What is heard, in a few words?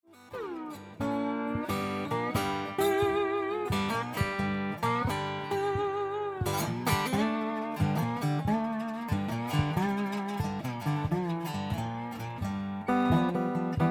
walking blues